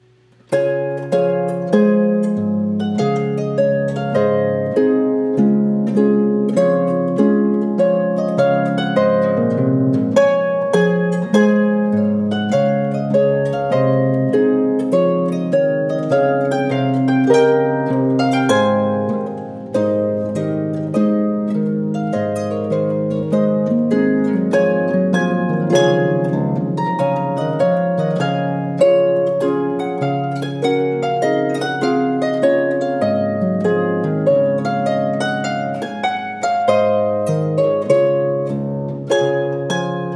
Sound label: Trumpet Tune